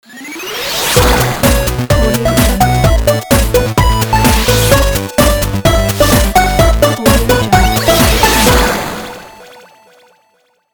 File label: 8 bit chiptune logo news